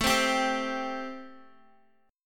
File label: A-Minor 7th A Guitar; x,12,10,12,12,x; strummed; Am7